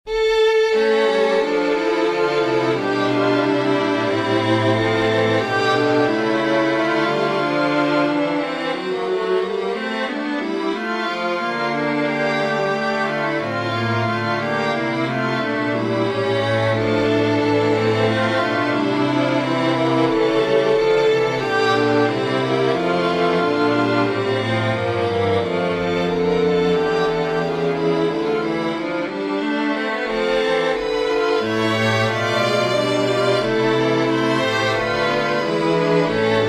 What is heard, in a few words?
chorale for string quartet; bach johann sebastian; das alte jahr vergangen ist